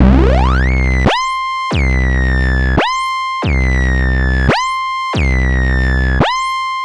Analoop, Industrial; Analog; Analoops; Sonic Foundry (Sony Creative Software)

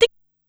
"Ti", drum kit; Korg Wavestation-SR; Wave 455 - Drum Kit

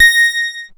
A#, PIANO; Big Fish Audio; Synclav FM

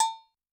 Agogo; Yamaha DTXpress-II; Perc

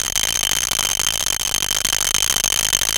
ELECTRO; E-MU Formula 4000 Series Vol. 3; Analog Odyssey

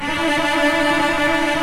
CELLI M TRLL; OMI - Universe of Sounds

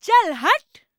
VOICE, EASTERN FX; ILIO; Vocal Planet

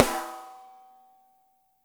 dry, snares; Spectrasonics - Burning Grooves CD2

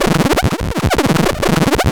LFO, Noise

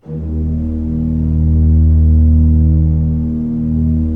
D1, Cathedral Organ; ILIO; Propeller Islands

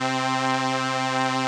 SOLINA, String Machines

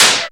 Perc FX, Percussion; Dance Synths

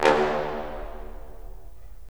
BRS, Roland LA Composer

